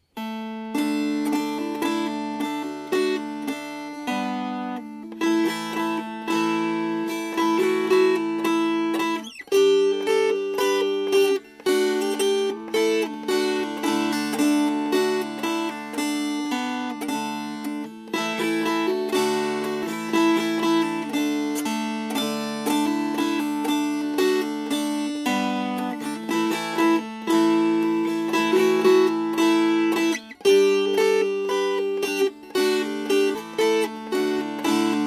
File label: Strummed